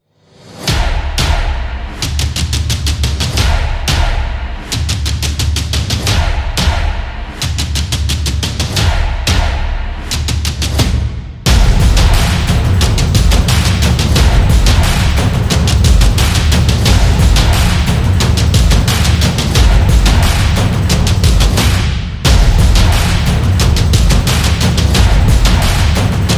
Drumming Beat Solo; samba

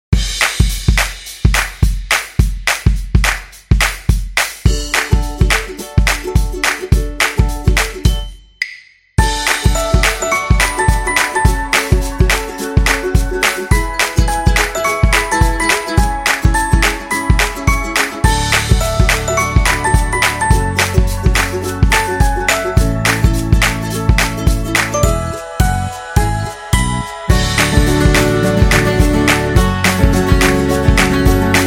Upbeat Folk; happy kids

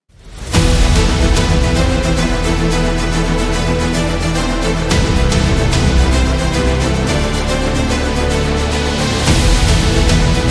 Intense Cinematic

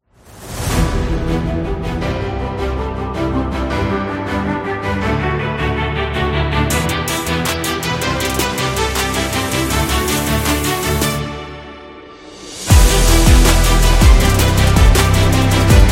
Trap Trailer

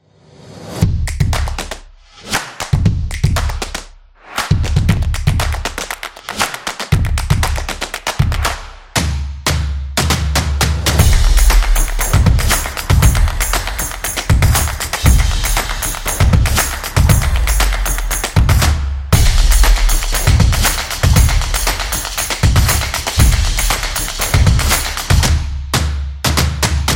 This Is Claps Energy

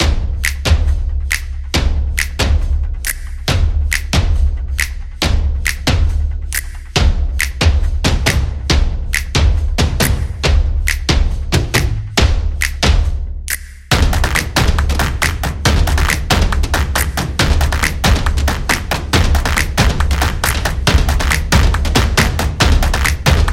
Rhythmic Drum Beat; samba

